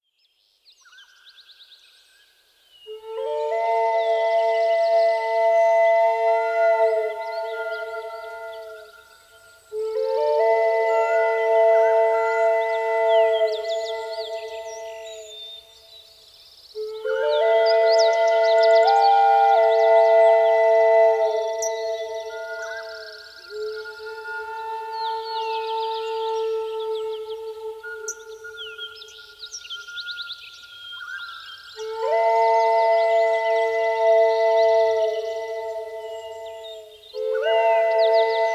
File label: Relaxing Flutes, Native American Flute